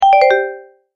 multimedia tone, Goodbye; Tones (Sound Effects:Sound Design); McKinney Sound

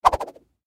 Whip Spin Twirl Whoosh Swoosh Rod Stick; Sound Design; Blastwave; Foley

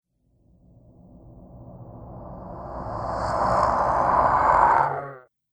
Zombie Monster Ghastly Breath

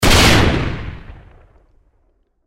Grenade Explosion Debris Blast; Grenades; Explosions; Warfare; Blastwave

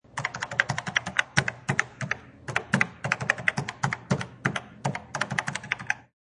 Typing on a cash register; Cash Register Sound Effects; Bars

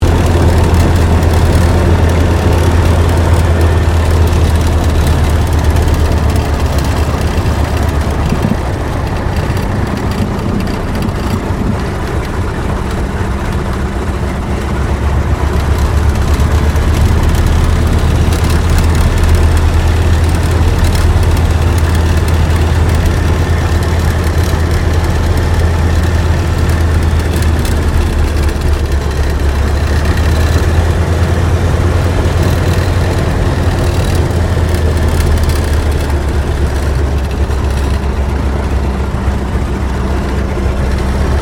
AIRCRAFT T-28D TROJAN TAXI INTERIOR

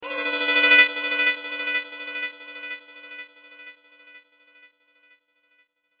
Laser Stutter Echo, science fiction sound effect; Andrew Fleming

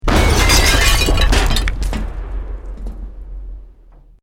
EXPLOSION MEDIUM BRICK WOOD GLASS NO FIRE

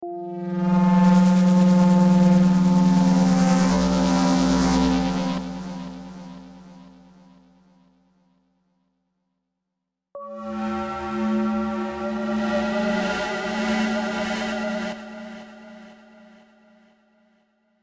Spaceship Flypast, Passing spaceship transition.; Spacecrafts

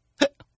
Hiccup; Human; Hiccup 5; Hiccuping; Hiccupping

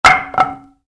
Baseball Bat: Dropped Onto Carpet; Sports; Stuart Duffield

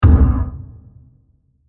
Diffuse Hit; Blasts; Impacts; Crafted Signals

